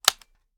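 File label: weapon selector off; gmod; foley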